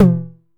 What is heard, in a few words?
909 Tom, EMU Proteus-2000